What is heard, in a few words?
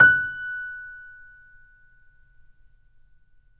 Mp F#5, Grand Piano; AKAI S6000 CD-ROM - Volume 2; Grand Piano 256M